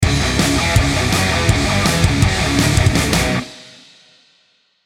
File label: Sting